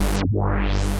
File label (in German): huellkurve sound filter inverted; Die Hüllkurve erklärt